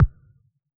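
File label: Analog bass drum, Yamaha DJX; 155 DJX Kit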